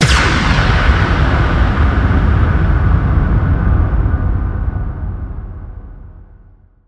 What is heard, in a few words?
lazer cannon; Crash Bang Boom